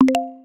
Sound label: loading complete; VoiceView